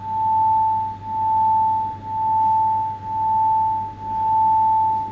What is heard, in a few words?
beats